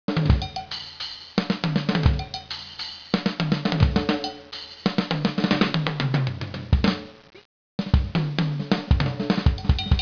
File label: jazz